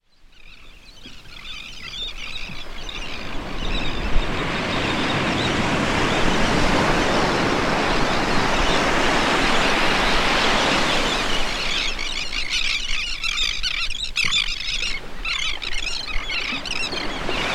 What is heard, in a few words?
Drakes Beach; PARKS; PRNS